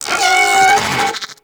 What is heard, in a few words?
Arachnoid Wraith Vocal Attack; Warframe; Corpus